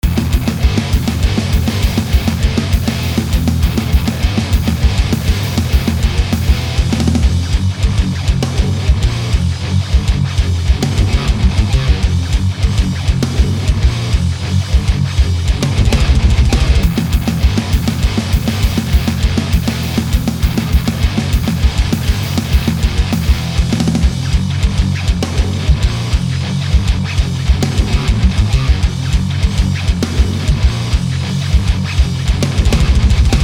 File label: riff test